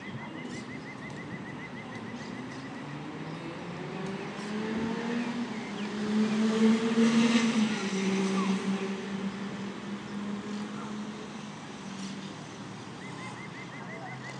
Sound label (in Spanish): moto con prisa